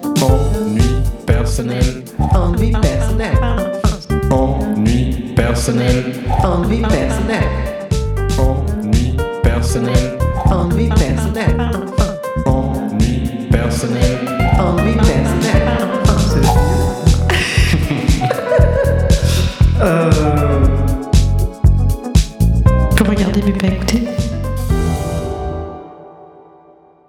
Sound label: Jingle Long; Jingles LONGS